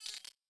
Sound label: Hi Perc, Data Mosh Hits; Hats - Shakers - Ride - Cymbal; 99Sounds; Mangling Audio